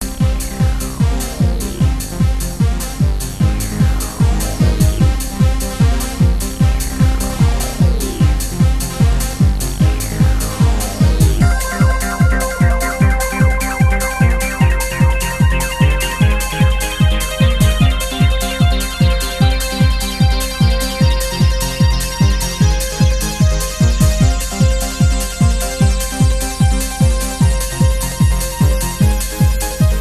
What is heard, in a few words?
hardcore